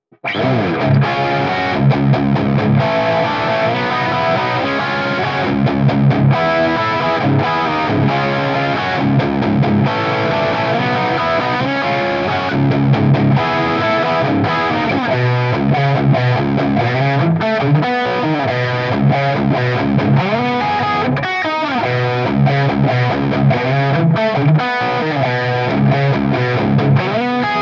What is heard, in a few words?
Wet - BYOG Hot Rod - Bridge